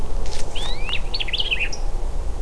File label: white eyed vireo